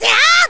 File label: Sword Spin; Link's big attack shouts (sword-spin, etc.); Zelda